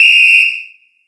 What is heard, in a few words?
Whistle; blue archive